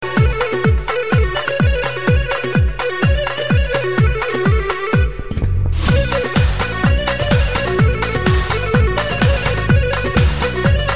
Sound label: Kurdish Khappa